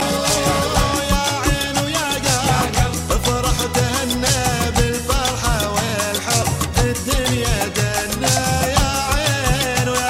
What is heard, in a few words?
Arabic